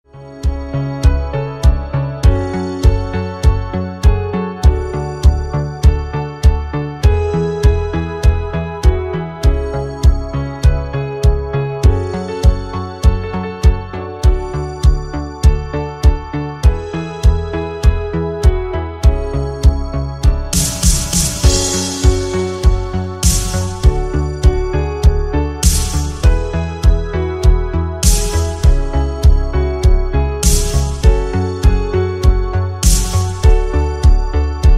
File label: italo disco